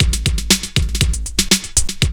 LOOP; E-Lab; X Static Goldmine 2

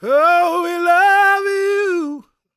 GOSP MALE, Vocal Planet Gospel

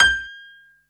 H-PNO G5

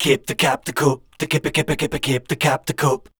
scat, voice

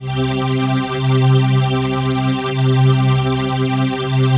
PHASE PAD, ATMO PADS; Best Service; XX Large

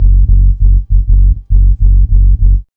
B-LOOP

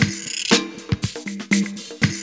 LOOP, DRUM; E-Lab; X Static Goldmine 2; akai

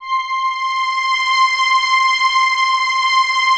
PAD, ATMO PADS; Best Service; XX Large

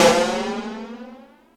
PERC; ZERO-G; Datafile

